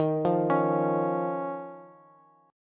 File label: Eb 7th Suspended 2nd, Bass; x,18,15,18; Eb7sus2 arpeggiated; JGuitar